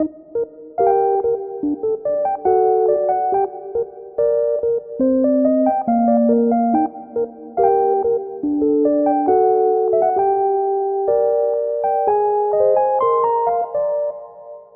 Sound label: piano